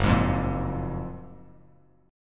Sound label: Gb Major 9th, down, Bass Standard